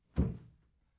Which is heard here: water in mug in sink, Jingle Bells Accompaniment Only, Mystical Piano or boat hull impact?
boat hull impact